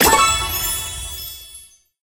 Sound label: event board double reward